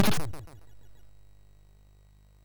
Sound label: SFX, MOS6581; Narco Police; MOS6581R3